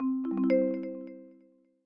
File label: battery full; Meitu V4s